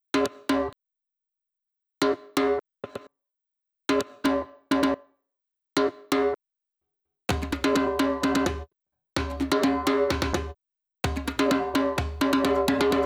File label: Drum Loop 128BPM Djembe; VEC5; Drumloops; Multi Loops